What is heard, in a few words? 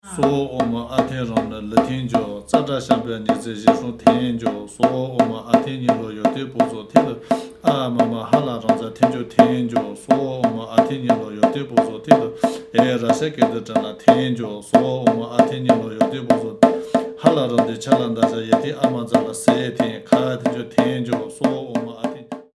སྔགས། sngag with drum and rolmo